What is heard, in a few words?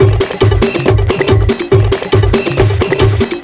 PERC